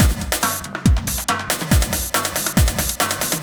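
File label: industrial 140 kit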